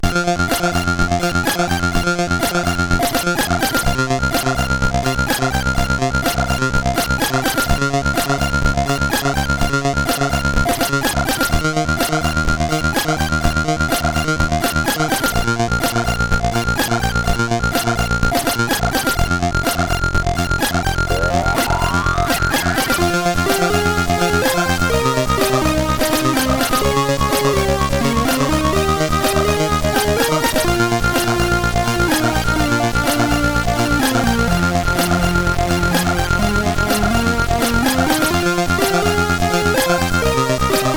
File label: Thunder Mix, CSG8580R5